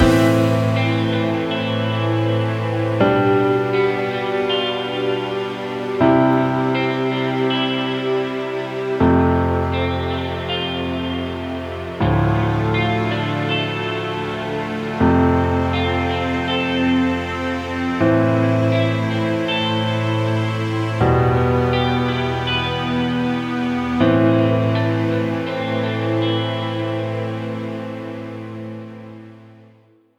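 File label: dramatic huzun guitar version; by burak sound bank; dramatic dram vol 16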